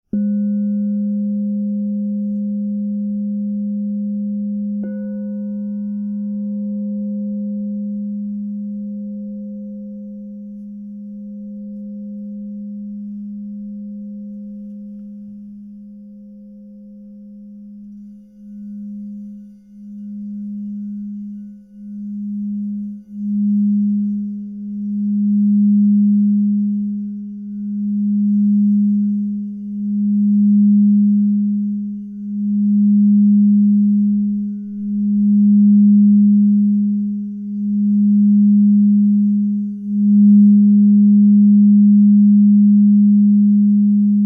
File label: Crystal Tones Singing Bowls